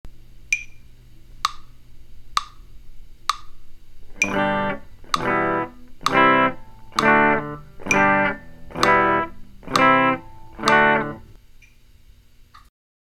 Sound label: Chord switching part II quarter notes, guitar